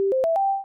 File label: power up